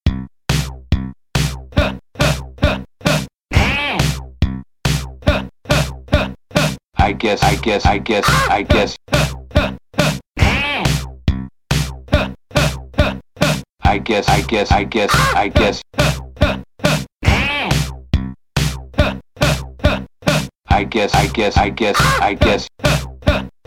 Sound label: Bass Synth Organs; Moore Rubbish